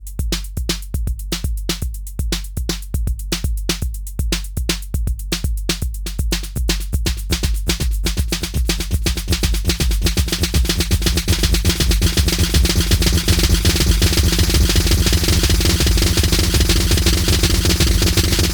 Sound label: Full Feedback Beat; Bastl Thyme